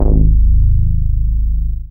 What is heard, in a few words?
BAS Mini, BAS1SYN; Best Service Gigapack 2